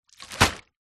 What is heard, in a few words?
plastic bag empty throw into deep water; zapsplat